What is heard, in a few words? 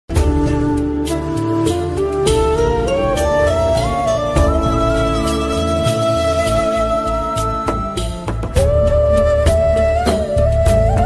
bgm ringtone; aadujeevitham; the goat life